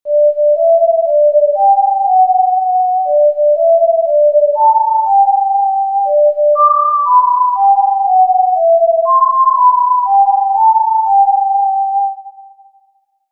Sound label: Tin Whistle; TIN-D